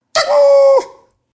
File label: SMS